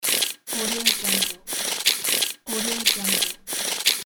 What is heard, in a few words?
cranking effect loop